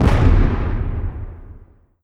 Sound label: explosion; space pew